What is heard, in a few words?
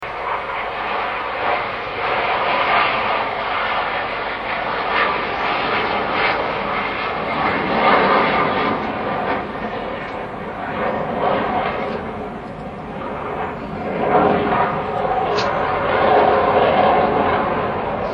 air noise